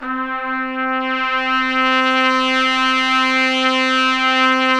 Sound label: BRS TP SWE, Orch Ensembl; Roland L-CDX-03 Disk 2